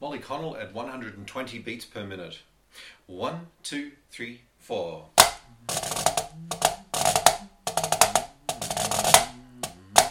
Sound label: snare drum